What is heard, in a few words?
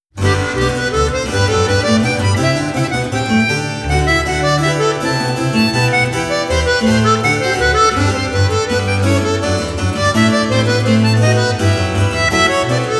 Sonata for violin and continuo in G major Vivace; BWV 1021